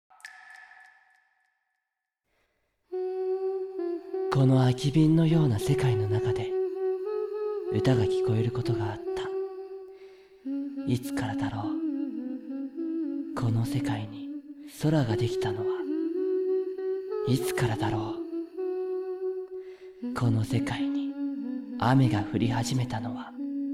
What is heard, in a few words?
rain drama